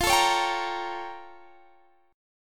A sharp Minor Major 13th F

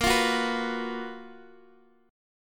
F sharp Major 11th A sharp

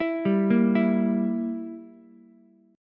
E Diminished E, Ukulele; Edim arpeggiated; JGuitar

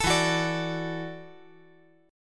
E 7th Suspended 2nd Suspended 4th, down

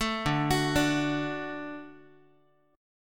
D Major D down Guitar; 12,10,9,12,x,x

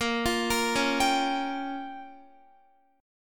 A sharp Minor 6th, Guitar; A#m6; arpeggiated

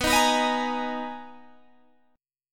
B Major 13th B, Guitar, Standard; strummed; 19,18,21,20,21,18; capo=18

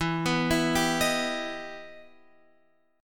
E Minor Major 7th

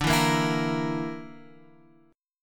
D Suspended 2nd Sharp 5th D

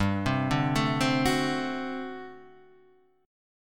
G Major 7th Suspended 2nd Suspended 4th G; GM7sus2sus4 Chord {3 3 0 2 1 2} arpeggiated

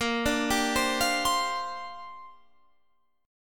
A sharp 6th Add 9th chord, Guitar, Open F tuning; arpeggiated; chordshape; 22,21,19,19,20,19

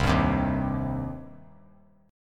C Minor C down; strummed; JGuitar

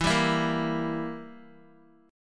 E Diminished down Guitar; strummed; JGuitar

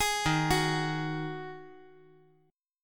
D sharp Minor Double Flat 5th; arpeggiated

